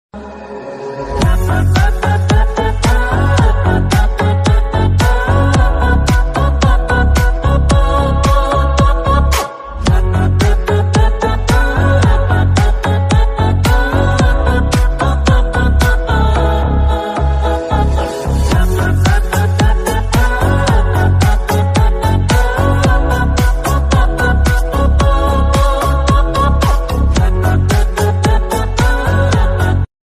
english ringtone bgm ringtone hollywood bgm ringtone attitude ringtone